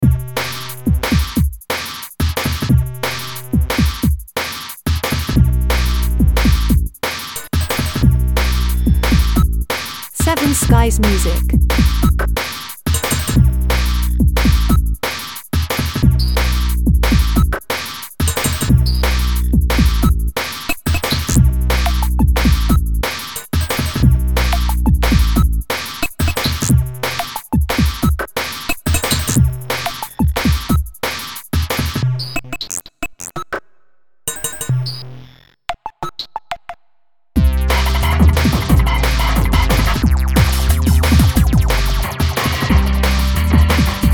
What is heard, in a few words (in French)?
rythme infernal - electro - construction industrial